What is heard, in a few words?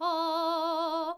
soprano E4